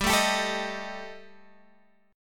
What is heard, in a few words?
G Suspended 2nd Sharp 5th, Guitar Open G